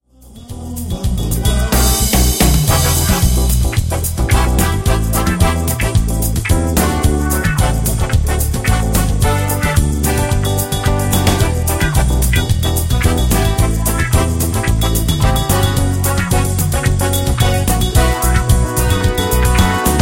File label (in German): Zuversicht Samba mit Backings